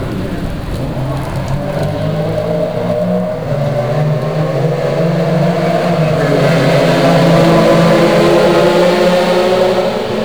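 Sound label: 2 porsches on straight, Two Porsches on the front straight durring a yellow.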